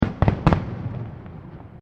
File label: Fireworks Multiple Firecrackers; Fireworks (Sound Effects:Backgrounds)